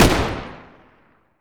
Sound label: m60 fire; garrysmod